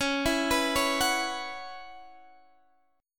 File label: C sharp Minor 11th, Guitar Drop D